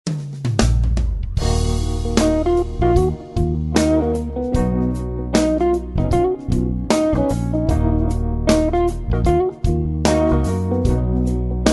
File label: jazz